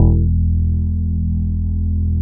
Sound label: MELO BASS; Synclavier Sampler Library; KEYS AND GUITARS